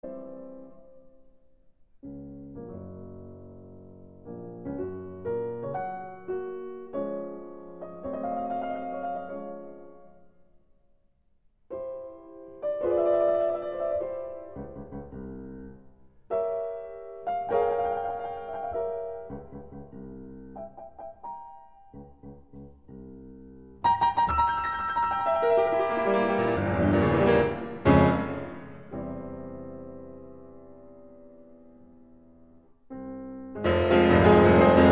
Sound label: Piano Sonata in F Minor, Appassionata I. Allegro Assai